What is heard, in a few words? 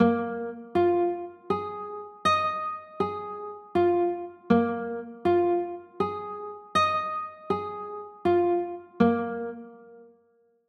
a sharp 7sus4, chords